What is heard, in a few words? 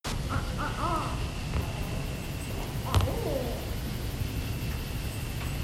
Owls